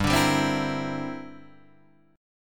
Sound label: G 6th Suspended 4th Sharp 9th, guitar chord; G6sus4#9 strummed; JGuitar